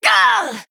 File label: Female Human Death